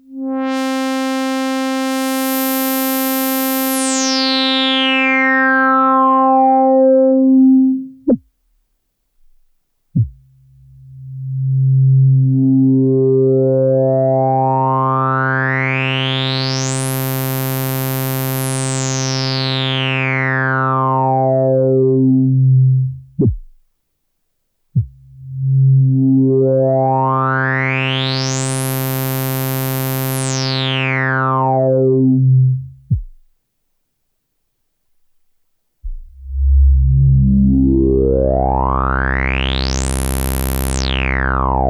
Bass Station 2

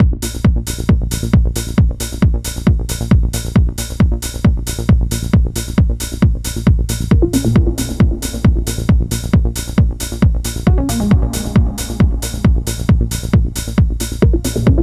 trance; obxd

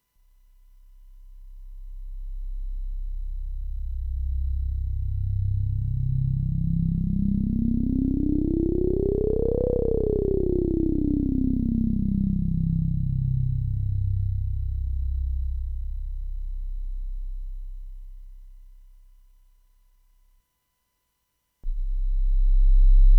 moog filter env 10sec ad low; solaris vs andromeda

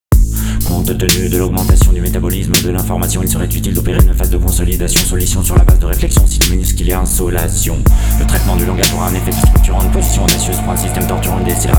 rap; diva

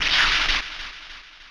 glisse

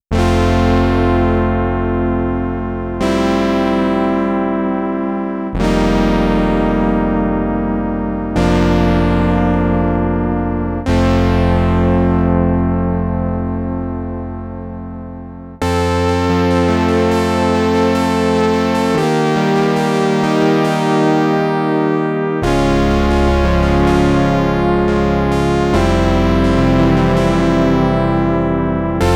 keys

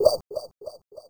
poing

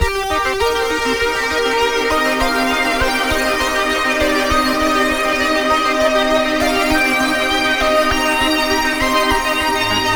synth

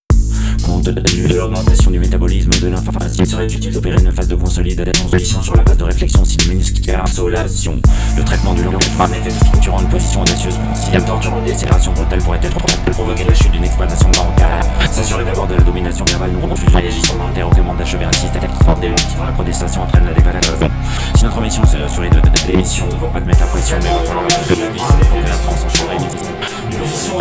rap; diva

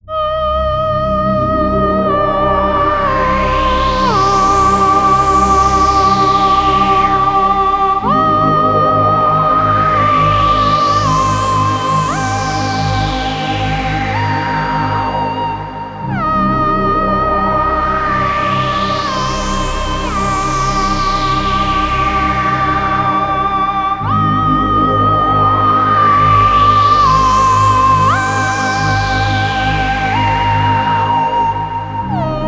moog church

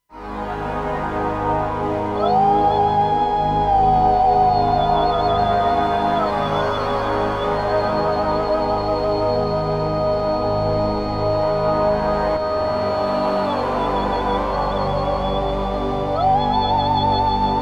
opera orchestra; solaris andromeda

